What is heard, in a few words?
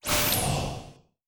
FLAME EXPLOSION